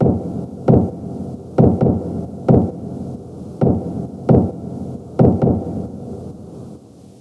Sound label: Synth, 133BPM, Dark Analog